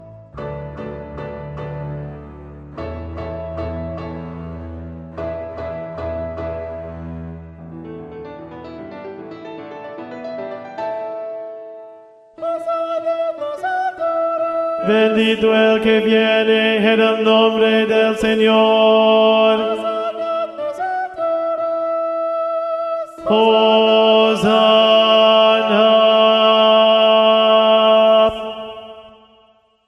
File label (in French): basses; sanctus; Missa Criola pour les BASSES